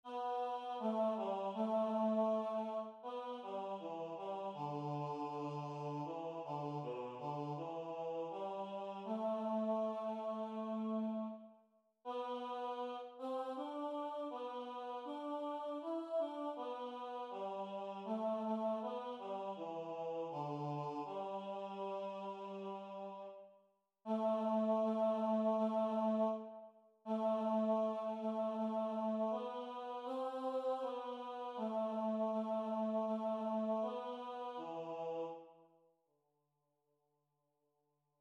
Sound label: Tenor